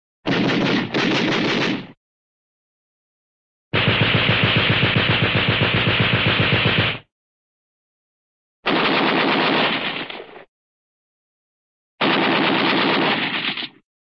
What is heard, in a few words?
gun, machine gun 50 cal.; cartoon